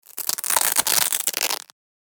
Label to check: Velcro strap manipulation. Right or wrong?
Right